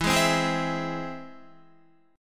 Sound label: E Augmented, Guitar; E+ strummed; JGuitar